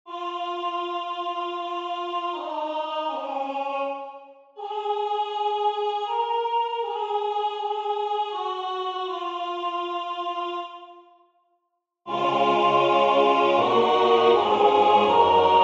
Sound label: MIDI SATB